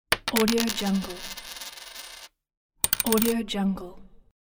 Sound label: coin drop